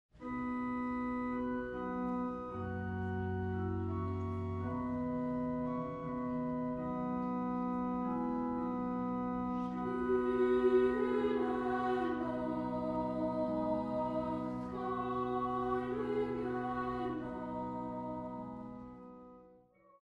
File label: Stille Nacht, boys choir; Christmas Songs; Sofia Boys' Choir